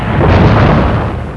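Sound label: THE EXPLOSION; WAR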